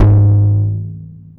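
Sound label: TOM loud; Zero-G; Chemical Beats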